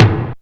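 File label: DRUMTRAKS low tom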